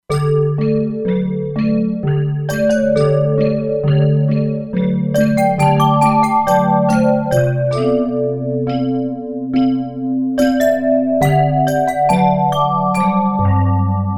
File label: Magic Bells, E-mu Proteus-2; Factory Presets